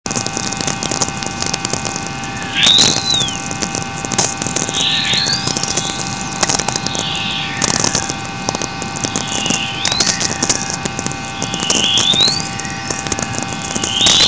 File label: Riser